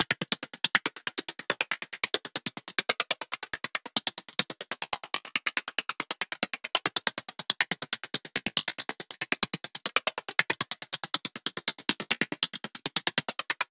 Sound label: loop 140BPM; Rialasten 2022 Xmas Sample Pack; 2022 Xmas Packs